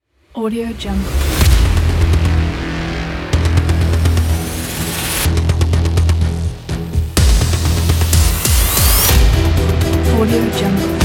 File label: agressive trailer ident; dimsonstudio